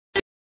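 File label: background music ringtone tamil background music ringtone; trending reel ringtone loco ringtone bgm ringtone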